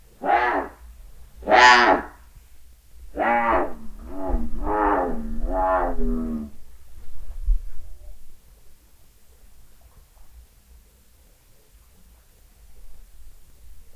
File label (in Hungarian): szelvedovel keszult felvetel, leopard fekete jaguar; állathangok; nyíregyházi zoo 2010